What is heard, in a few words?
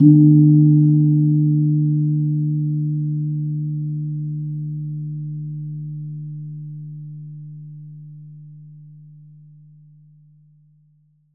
GONG D#3; USB Soundscan vol.60; Asian Traditions; AKAI